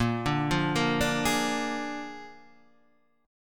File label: A sharp 7th A sharp, Guitar